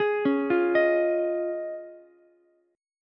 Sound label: Db Major Add 9th, Ukulele, C6; arpeggiated; JGuitar